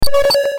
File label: button